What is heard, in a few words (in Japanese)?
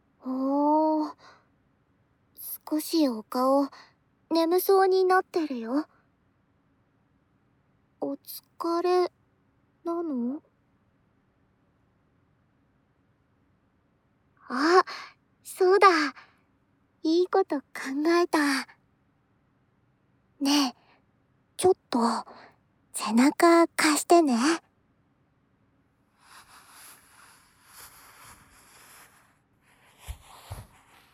肩たたきで疲れた身体をリフレッシュ